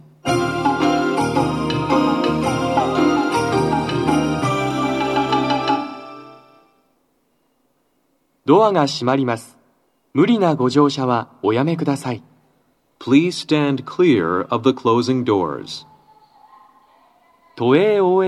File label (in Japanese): 発車サイン音, 両面箱型での収録です。